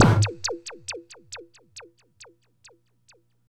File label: END, Tekno Industrial; East West